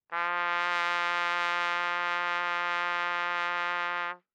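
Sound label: Trumpet vibrato ff F4 stereo, Bb Trumpet; MIS Pitches - 2014; Brass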